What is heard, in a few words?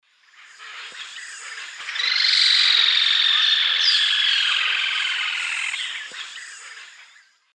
Laterallus melanophaius, song; S. America; Eastern Lowlands